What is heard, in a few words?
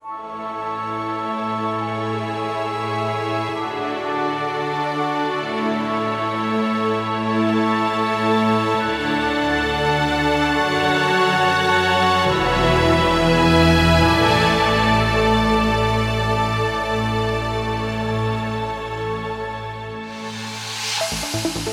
Complete Mix, 137BPM, A major; Vengeance Samples; Heavenly Kit